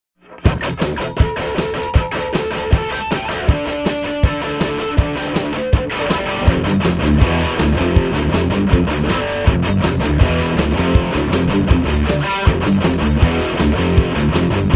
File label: Guitar Drive; Soundzabound Volume 5 Disc 2